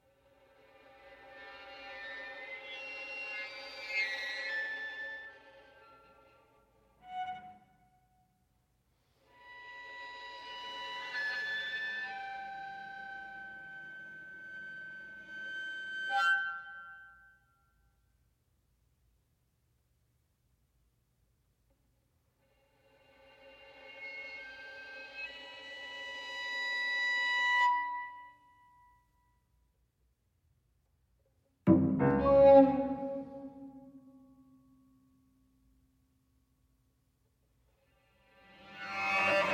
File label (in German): für violine violoncello und klavier